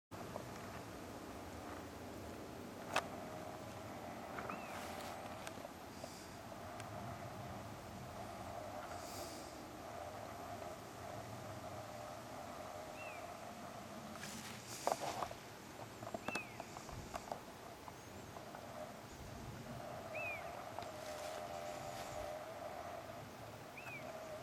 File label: honey-buzzard juv anxiety call; swallowship